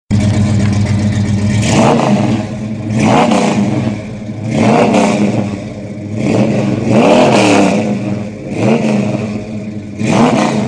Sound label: Dodge Charger SRT8